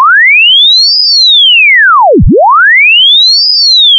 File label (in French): repliement